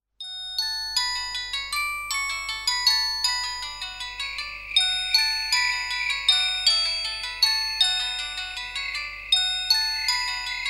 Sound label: mobile